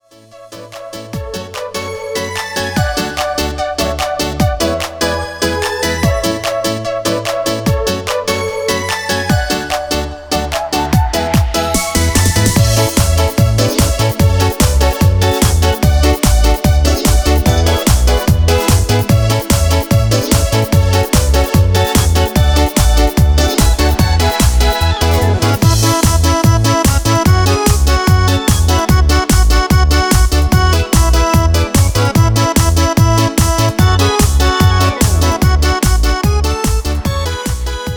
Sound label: Disco Polo